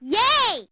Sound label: boy yay; Microsoft Graphics Studio Greetings; Microsoft 1998